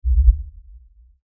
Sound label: Lfe Swell Low Bass Movement; Swells; Blastwave